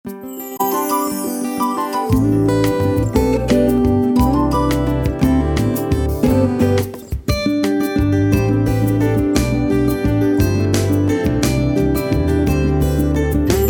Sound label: jingle INSTR; jingles; Itacate